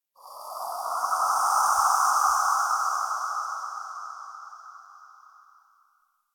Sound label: Ext Wind Dissonnant Death Howl; Ambience; Misc Outdoor; Warframe; Infested Eris